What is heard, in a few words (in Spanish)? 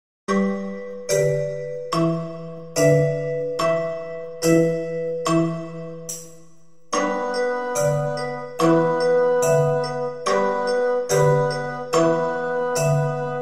flauta